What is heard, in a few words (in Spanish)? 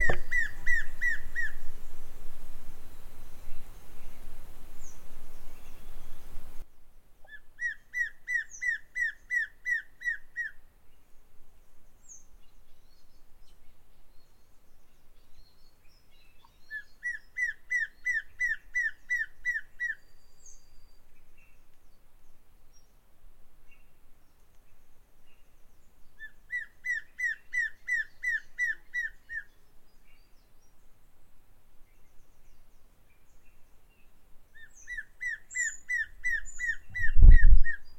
Batara Pecho Negro Macho, White-bearded Antshrike (Biatas nigropectus)